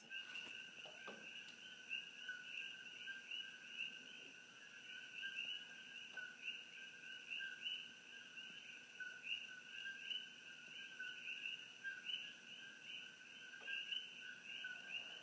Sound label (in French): Grenouilles